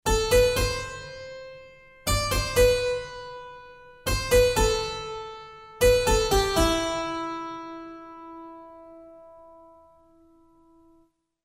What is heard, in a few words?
Harpsichord Stones